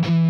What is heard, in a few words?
POWCHUG, Guitar & Bass; 90 s Sample CDs; Quantum Leap - Guitar & Bass